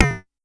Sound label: Pollard Perc, Syndrum